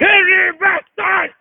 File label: attack; svencoop; morass beta; armorman